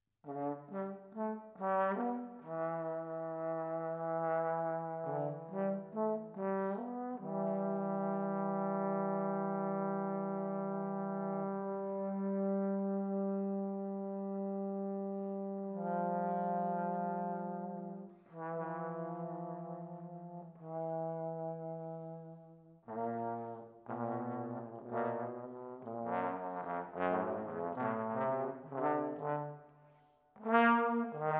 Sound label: Trombone